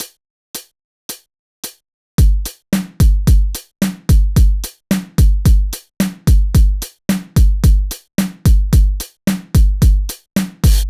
Beat 110bpm, kick variations for guitar practice drum; kick variations for guitar practice drum foundations vol 1